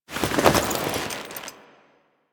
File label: Move Foley Long A; Warframe; The New War; Veso; Quests